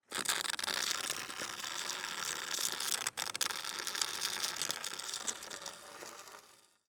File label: ICE Hockey stick medium long scrape